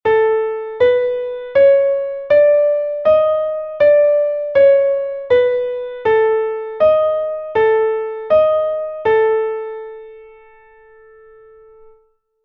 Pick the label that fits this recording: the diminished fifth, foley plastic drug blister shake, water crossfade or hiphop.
the diminished fifth